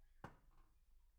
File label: Room